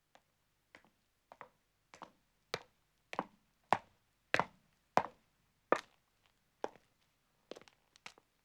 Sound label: Stone Stairs (Echo) Descending, Footsteps; BBC Sound Effects Library; Footsteps II